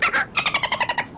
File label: dolphin; minecraft; technic